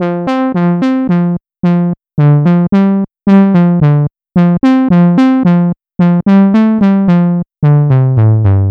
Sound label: Moog, Trip-Hop Loops; Sonic Foundry (Sony Creative Software) - Rondo Brothers Trip-Hop Loops & Samples; Apologists